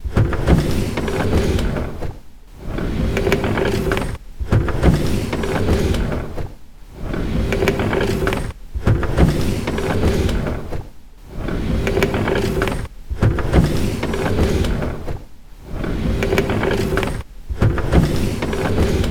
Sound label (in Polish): machina (petla bujanie); apocalipsis